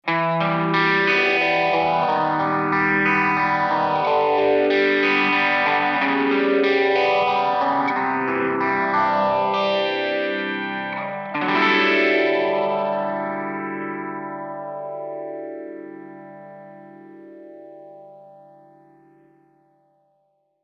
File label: Slowdrive; A slow and deep sweep works on the harmonics created by Sunset.; zelzah; Way Back Machine.